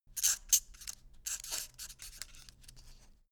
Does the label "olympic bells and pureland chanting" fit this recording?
No